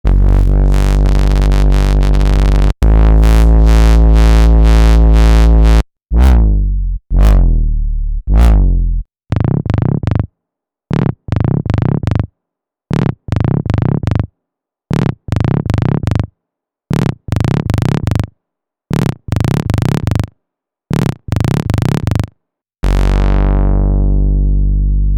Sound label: animal basses; Zeeon